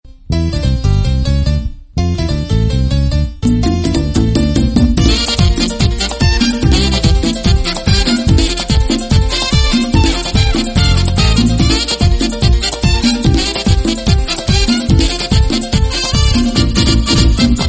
salsa dance

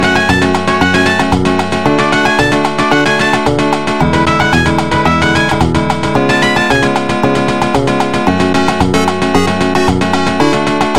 8 bit

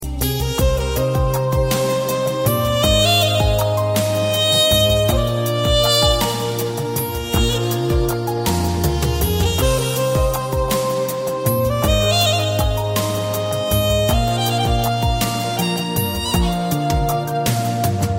soft sax

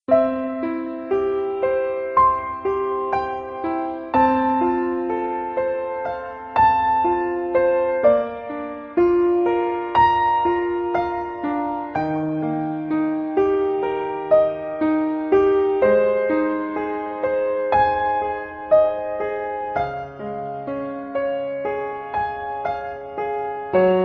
romantic

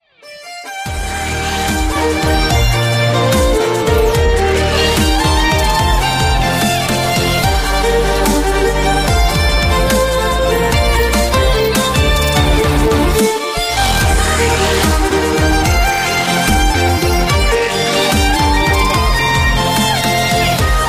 dubstep; pokemon

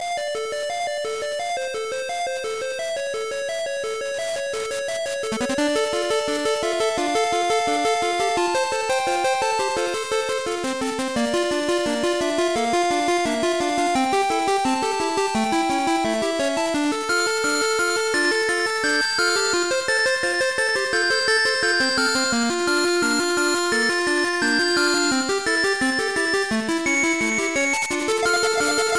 8 bit; gravity falls

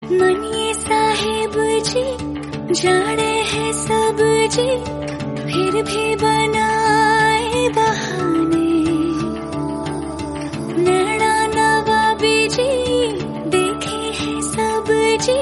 hindi

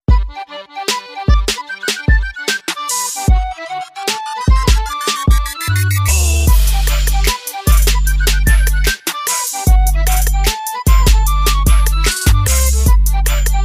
trap remix ringtone; krusty krab; iphone ringtone

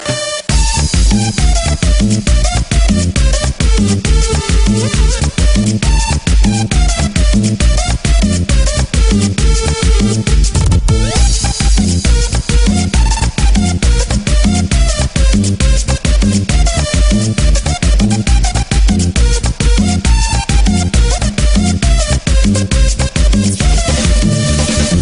tribal

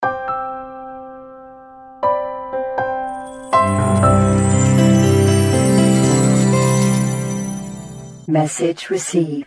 magic sms

